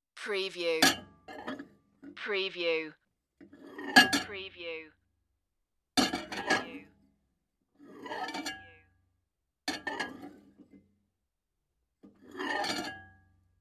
dishes and microwave

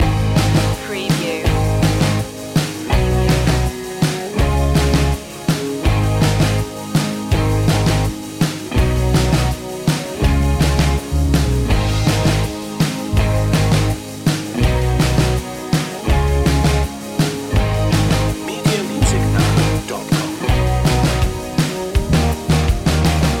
breakin waves loop

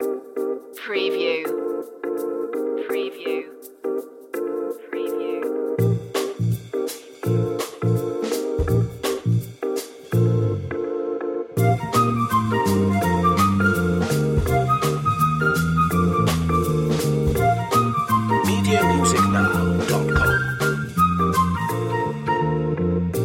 no marimba vibraphone or bells

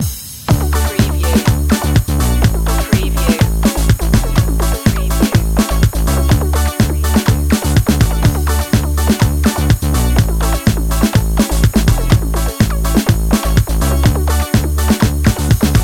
techno chicken underscore version

